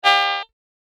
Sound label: A Single Buzzy Zap; Zap Sound Effects; science fiction sound effect; star wars / trek style games; Andrew Fleming